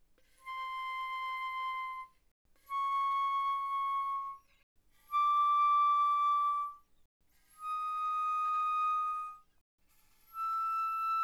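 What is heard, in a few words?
Flute non vibrato pp C6 Bb6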